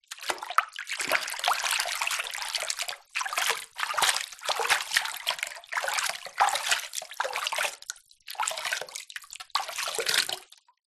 household water movements hand bathroom sink splashes